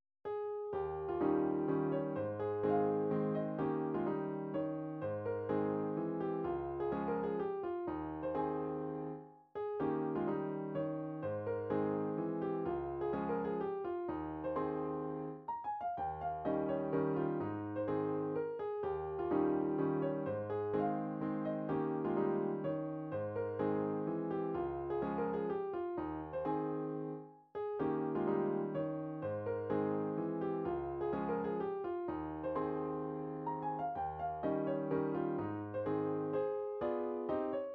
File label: mazurka, midi; skriabin